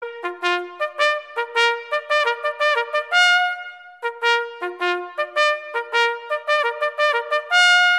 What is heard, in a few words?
latest swedish army ringtone